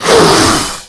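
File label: Stun Attack; Seer; GamigoNA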